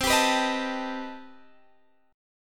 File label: C Minor Major 13th C down; CmM13 strummed; JGuitar